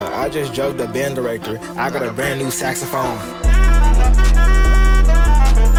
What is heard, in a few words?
saxophone